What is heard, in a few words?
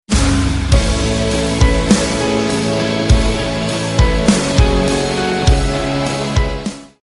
instrumental